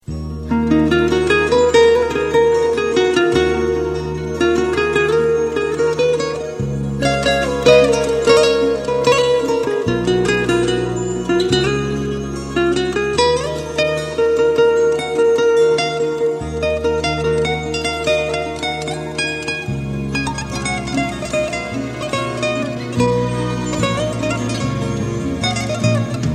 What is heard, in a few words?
romance guitar